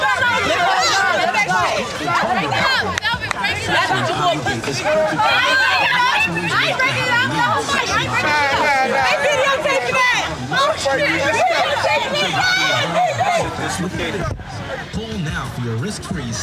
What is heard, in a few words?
Crowd Noise; Cinematic Funk Urban Tone Poems; Session 05 - The Triad; SFX